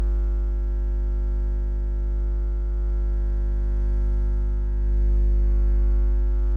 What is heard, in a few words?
buzzing; civ13; civ14